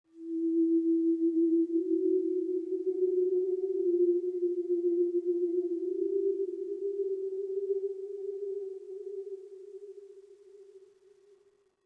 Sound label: Oneshot Synth